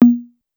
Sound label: Tom Hi, Pitched Down; Clavia DDrum-AT